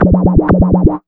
125 DRM, Fresh Disco House

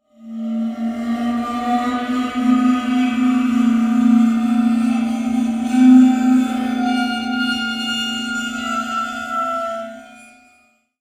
Waterphone Shine

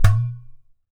GHATAM, DRUMS OF IND; AKAI S6000 CD-ROM; WorldSounds

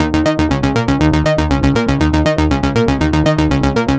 Eb 120, Synth Loops, Acidized; Zero-G; Soundclash Nu Dance Electro; 120bpm

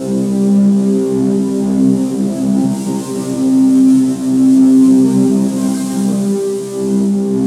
SNARLS, Pads; Distored Reality 1&2

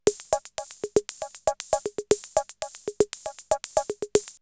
CR78 BeatBox, drumloops, DrumMachines

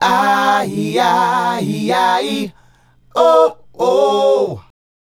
Male Group, Vocal

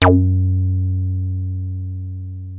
303 F#2, TB 303; Hollywood Edge; Giorgio Moroder Rare Synthesizer Collection

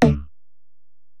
EPerc, Alesis DM-PRO; 300 Drum Machines

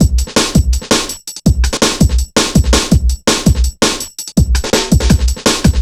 165, drum loops, drum & bass; Total Drum&Bass

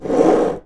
MOVE, HOUSEHOLD; AKAI Anime Sound FX

